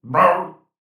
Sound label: Warrior Death; Realm Royale